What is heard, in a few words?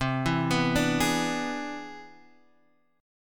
C Augmented Major 9th, Guitar, Standard; arpeggiated; JGuitar